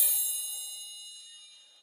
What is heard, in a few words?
triangle